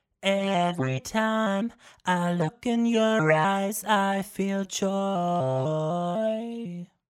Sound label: Vocals Auto Motion; Tron